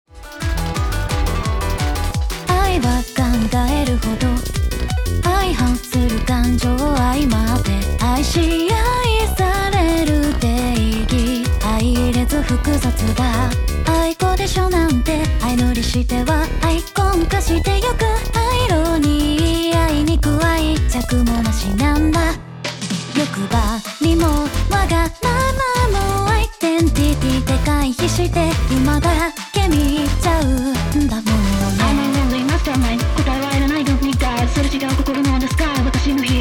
Synthesizer V, 歌声; サンプル音源; 解説で使用する楽曲; Synthesizer V Studio 2 Pro

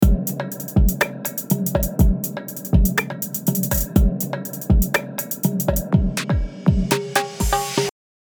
リバースシンバルのサウンド; Logic Pro 11; セクションの終わりに向けて盛り上がりを演出

